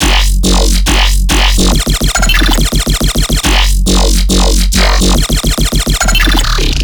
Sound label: Riddim Bass Loop F# 140; Hoverboard; Ghosthack; Riddim Essentials Volume 2